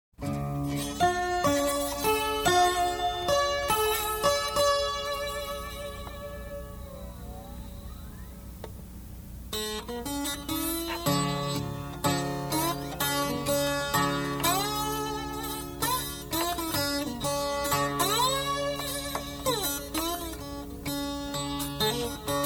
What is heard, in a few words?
Slide Guitar, Country blue; Jazz & Rhythm & Blues